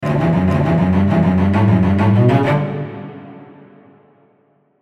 Piano Roll, a rising cello line, which is dynamically uninteresting